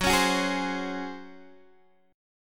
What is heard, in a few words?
C sharp Major 7th Suspended 4th F sharp